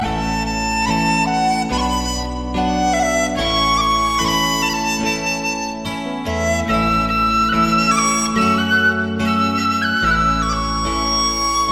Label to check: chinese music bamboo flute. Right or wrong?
Right